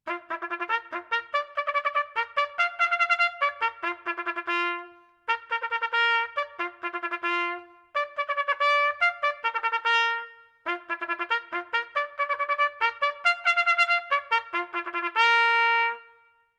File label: Stable Call, bugle; aka care of wheels; c1880 Cycling Bugle; henry keat & sons cycling bugle